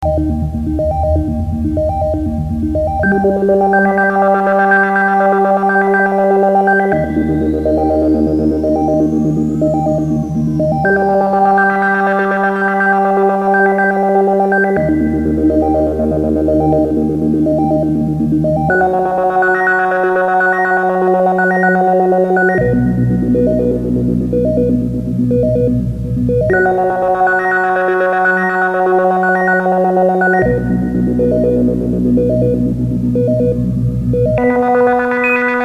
better tracking vco; analog synth

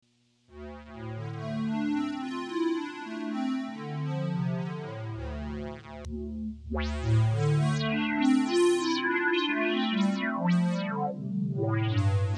envelope follower synth squares

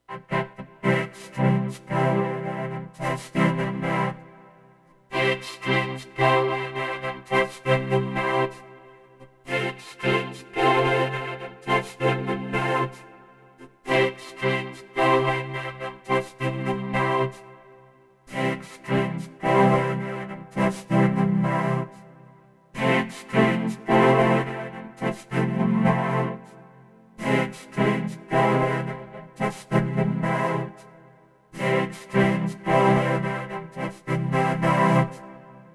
big strings going; analog synth

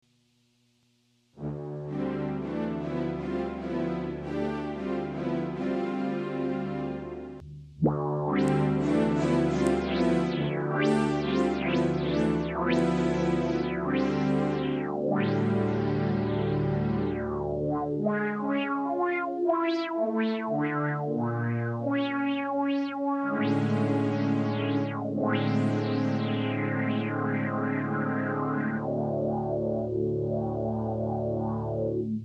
envelope follower synth strings

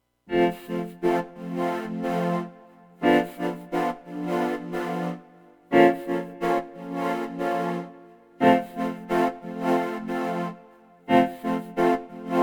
piano strings white noise, vocoder; analog synth

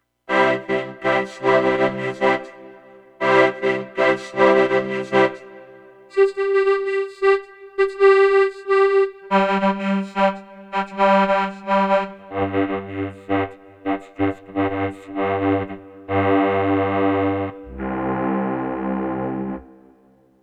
musette, vocoder; analog synth

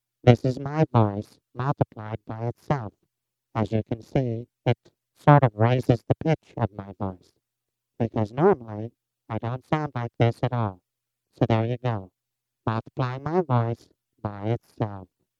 Vocal Squared, ring modulator; analog synth

